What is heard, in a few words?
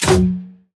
Grenade Launcher Shot; Weapons